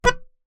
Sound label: Melodica Short Note